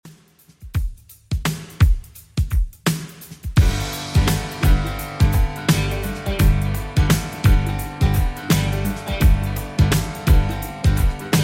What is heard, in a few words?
80s Redrum 85bpm